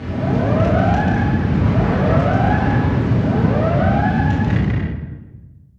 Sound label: distance generic ship damaged loop; Hearts of Iron IV Death or Dishonor